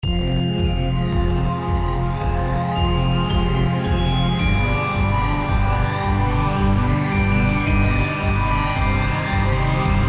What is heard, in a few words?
110bpm